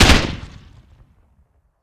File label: explosion grenade